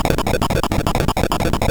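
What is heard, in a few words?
Mountain King, MOS 6581 SID chip music, games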